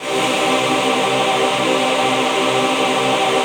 VOICE PAD; Best Service; XX Large; Pads

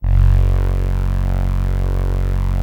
Atmo Pads; Best Service; XX Large

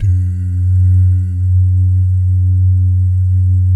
"doo", Vocal Jazz Fx; Spectrasonics; Vocal Planet Vol.3 Jazz Fx

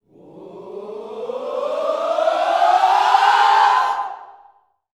whoops, Vocal Gospel yell shout; Spectrasonics; Vocal Planet Vol.1 Gospel; akai

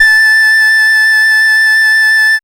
techno; E-Lab; X Static Goldmine 1

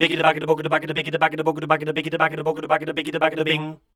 SCAT #D, Voice

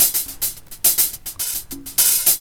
LOOP; E-Lab; X Static Goldmine 2; akai

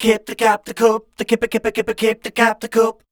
SCAT, Voice

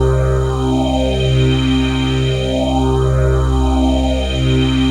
PHASE PAD, ATMO PADS; Best Service; XX Large

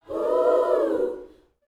Vocal Gospel PHRASE; Spectrasonics; Vocal Planet Vol.1 Gospel

